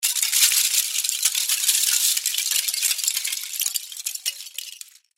Crash Debris Plastic Pieces Objects Fall Drop Long; Blastwave